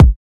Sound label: 00s reverb bass drum single shot a sharp key